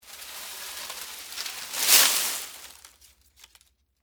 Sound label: Foliage Drop Move, Palm, Frond